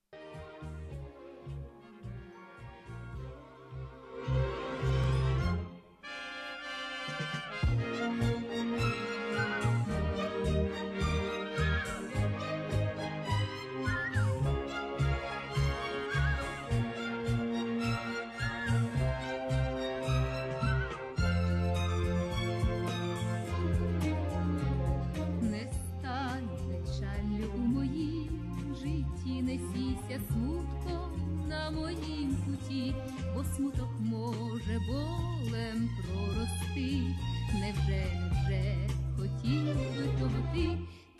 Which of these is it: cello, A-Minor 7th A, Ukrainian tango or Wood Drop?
Ukrainian tango